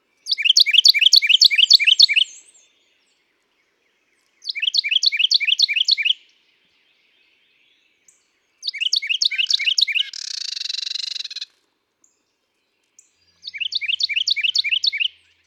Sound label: Carolina Wren Song and female calls